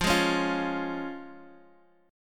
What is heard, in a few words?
F Minor Sharp 5th, down